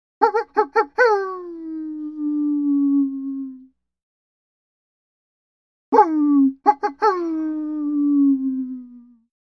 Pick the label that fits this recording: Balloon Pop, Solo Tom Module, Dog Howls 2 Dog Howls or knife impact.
Dog Howls 2 Dog Howls